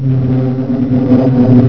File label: FLUX WIND; EFFECTS